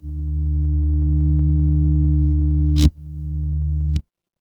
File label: fire electric hum